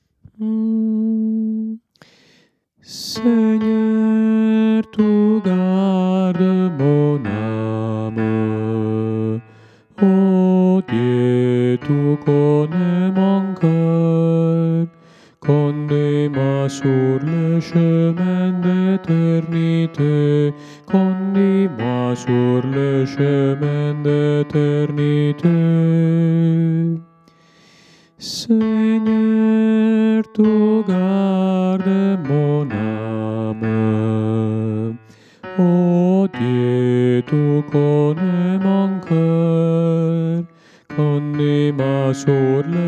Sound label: seigneur, bass